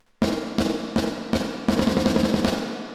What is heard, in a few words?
reverb tremolo; PHYSION